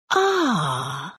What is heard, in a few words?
heal; JK2; TAVION; weapons; force